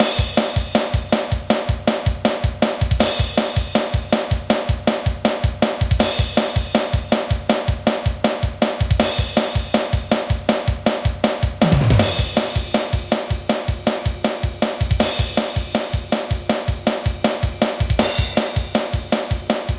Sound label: Drums